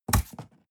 cardboard box close fold scrape short

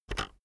keyboard external enter press very fast soft

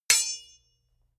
sword hit sweetener

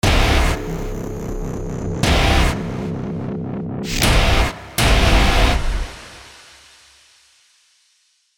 hard distorted logo

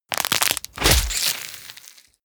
tear out bones fleshy

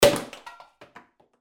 corking

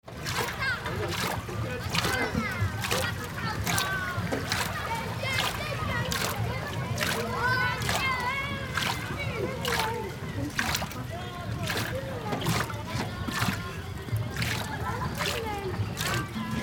water and playing children

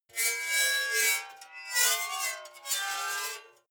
metal scrape rich sequence short

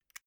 zippo flicked close soft

